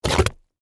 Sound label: Suction Mud Gurgle, Texture, Suction, Wet, Gurgles, Mud; Water Sound Effects; Water (Sound Effects:Nature)